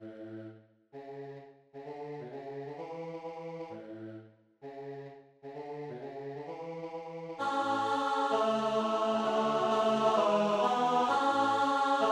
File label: I Like to Rise tenor